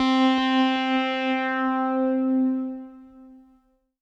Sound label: Pad C, Synth Prophet One Shots; Electronisounds